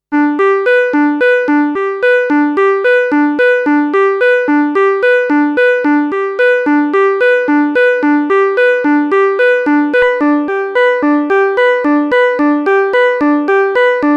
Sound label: BD Sharp Lead